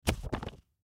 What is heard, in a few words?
Magazine Set Down; Magazine Set Down Carpet; Set Downs; Foley